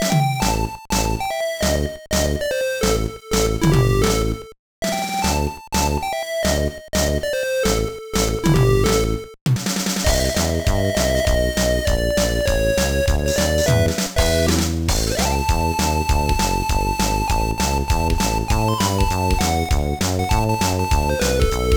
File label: BGM; Shaman King Chou Senjiryakketsu 3; GBA